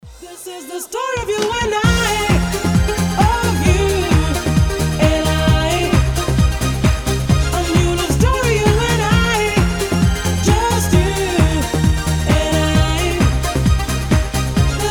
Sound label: beat mix